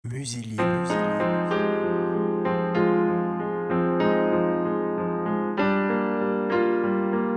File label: autumnal piano